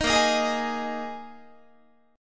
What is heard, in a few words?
A sharp 7th Suspended 4th, Guitar; A#7sus4; strummed